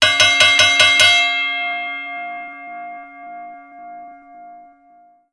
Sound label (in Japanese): 沖縄 gong